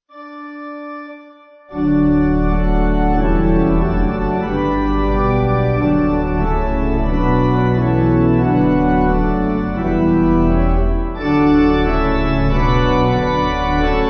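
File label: Lords Prayer Pipe, Pipe Organ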